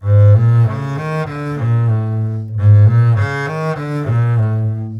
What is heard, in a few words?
Bombay string 2 bars